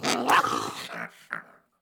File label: rat hurt